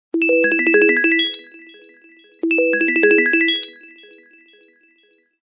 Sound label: Twinkling bell; Samsung; GT-B7722; Ringtones